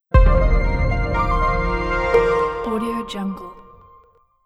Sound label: bright short positive logo reveal